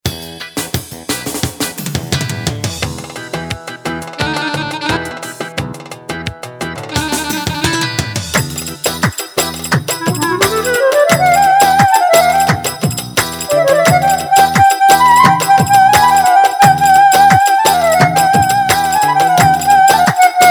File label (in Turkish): ciftetelli; roland